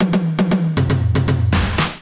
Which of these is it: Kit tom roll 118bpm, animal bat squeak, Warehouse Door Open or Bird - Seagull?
Kit tom roll 118bpm